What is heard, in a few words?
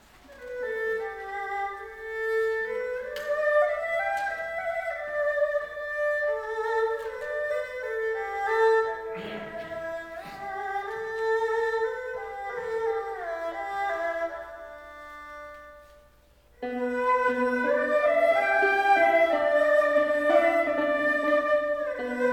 trio folkmusic trio